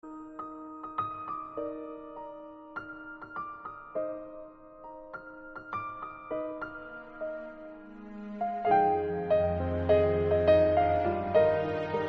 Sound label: piano